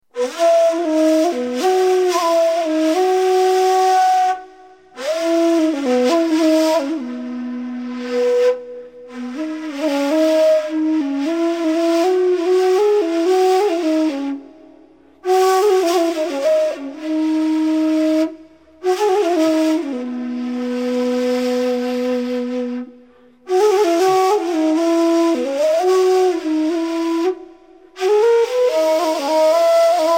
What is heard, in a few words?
kaval persian position